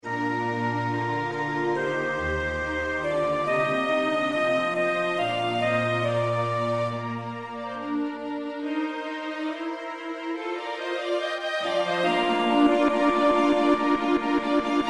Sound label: Organ Kyrie